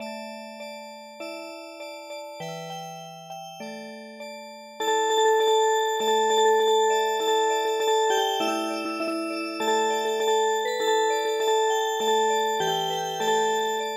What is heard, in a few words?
Music Box; Tumbler; staring out game